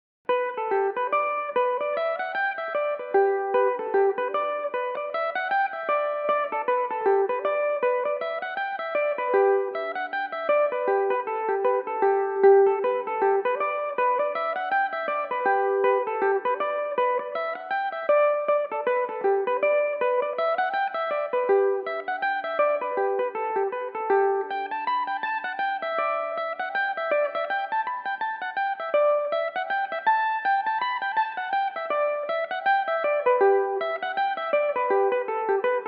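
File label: reel G major, mandolin; Belmuse EM-190 electric mandolin; The Augustinian Reel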